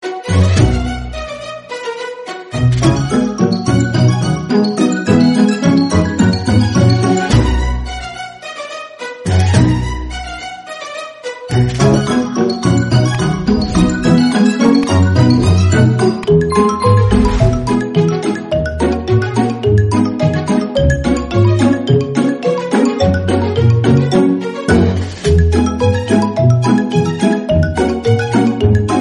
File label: cool cartoon background music